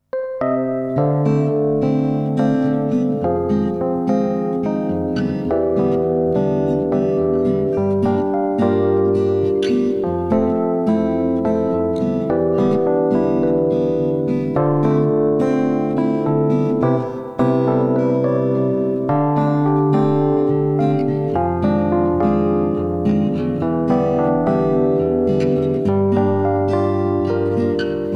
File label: night sea inst